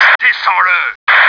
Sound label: pain